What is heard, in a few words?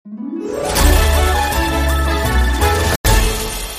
Click Small Game Start